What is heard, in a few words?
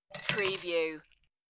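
Ice Dump; General sound effects heard in bars and restaurants from glasses clinking, mixing drinks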